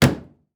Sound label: Car Ford Model A Close Door Exterior; transportation